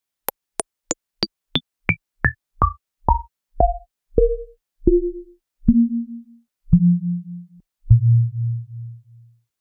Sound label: Metal Hits Glass Ping Synthesised